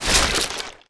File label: DROP SKELE